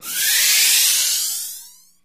Warping End Cut, starship

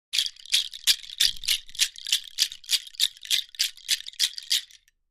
Shaker Bracelet Natu, percussion shaker

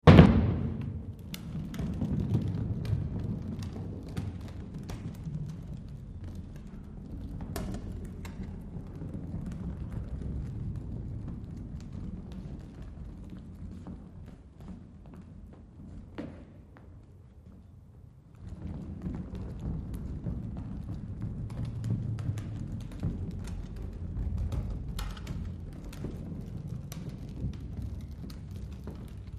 Ball Heavy Ball Roll

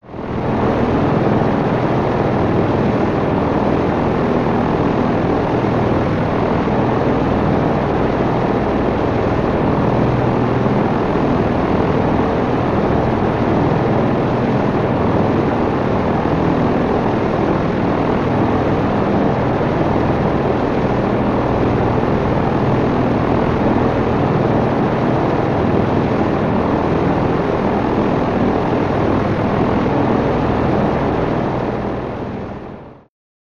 Plane P38 Interior S, ww2-fighters p-38 steady

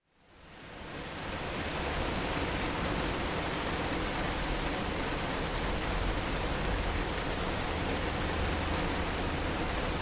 Rain Thunder Ambience, rainstorms

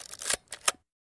Antique Camera Film, shutters; shutters & movement

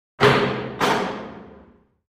Push Bar Door Open

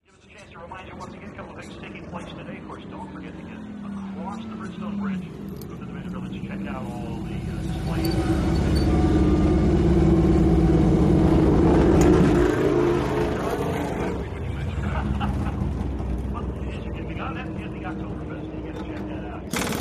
Sports Car Race Pit

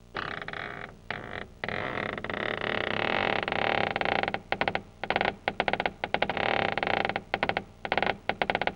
Door Creak Lng Drawn

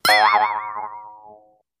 Ping A Boing, boings

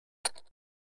Poker Chip Stacked; casino games chip mvmt

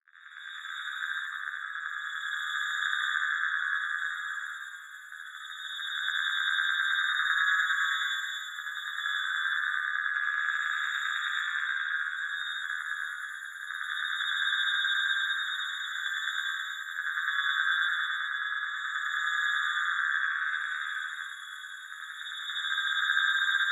Alien Cosmic Transm, radios

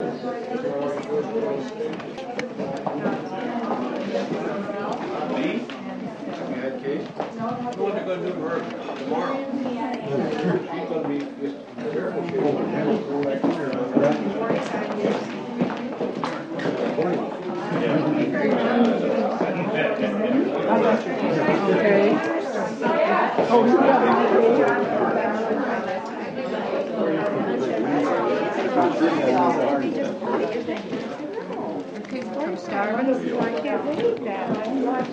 Small Family Gathering, Walla; murmurs various

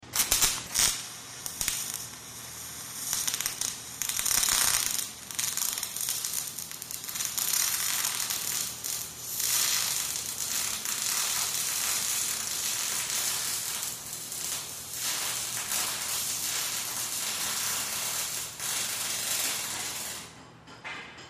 Welding Various

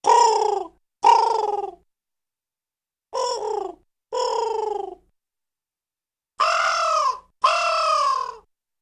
Crow Coos Caw Unique; birds

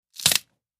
Snapping celery, crispy double

